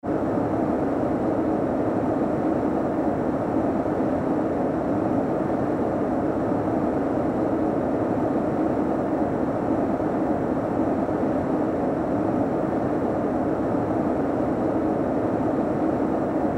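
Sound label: jet interior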